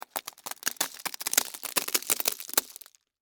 Crack Crunch Slow, Texture, Crack, Crunch, Slow, Penne, Rigate; Blast Wave